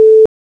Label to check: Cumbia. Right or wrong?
Wrong